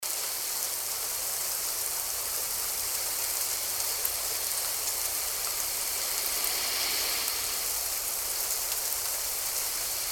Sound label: whish Rain